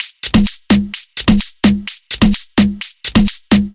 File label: Loop, 128 BPM